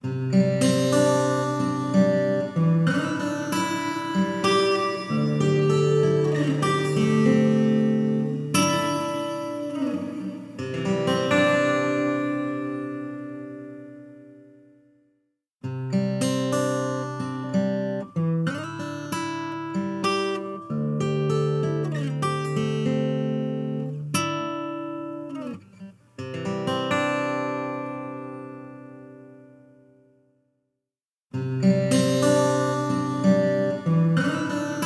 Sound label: Acoustic; TemperanceLite; Acoustic Guitar